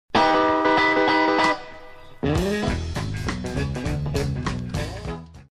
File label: eastbound train intro, guitar